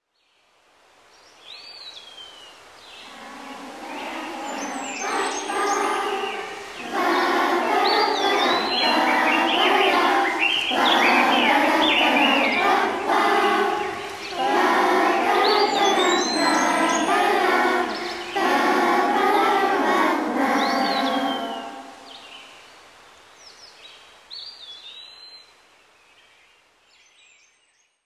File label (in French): chanson; projet jardin sonore